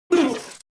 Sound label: drone scan tap; secretagents